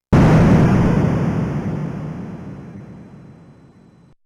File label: Miss; Tank Battalion game sound effect